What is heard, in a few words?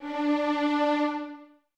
Violins D 4, String Ensembles; Miroslav Vitous; LINKS ORCH